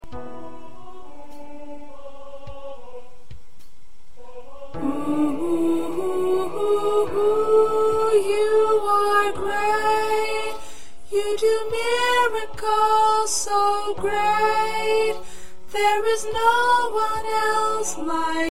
P Soprano

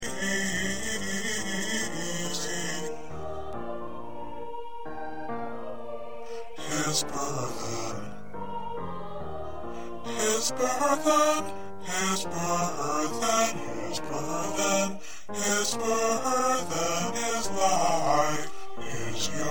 Bass, choir parts

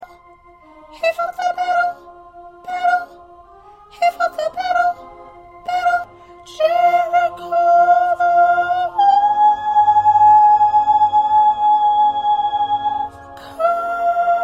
Soprano 1B

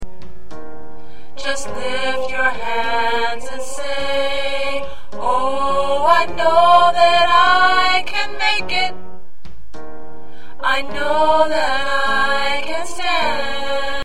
Soprano

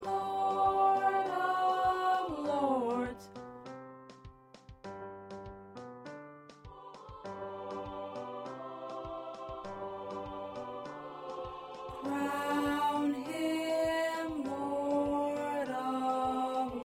2nd Tenor

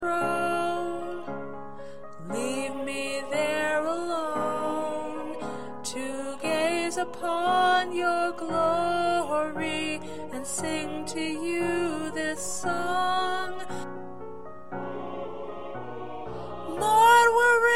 Lead

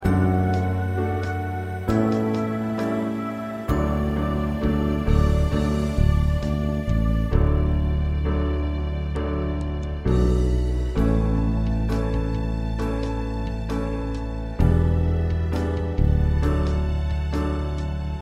Accompaniment

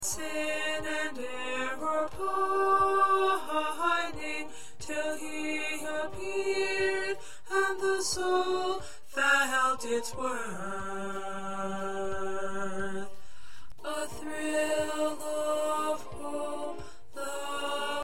Tenor, choir parts

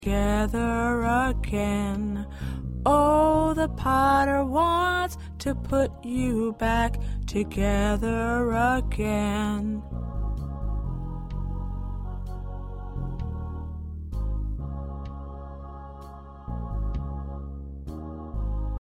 Lead 2

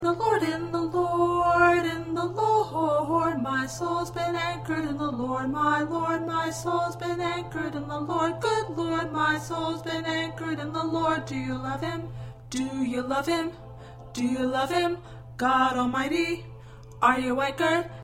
2nd Alto

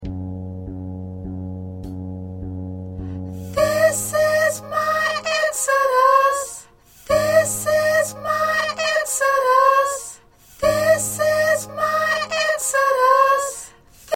Soprano

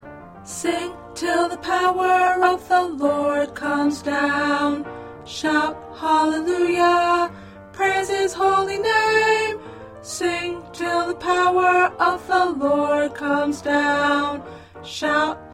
2nd Soprano

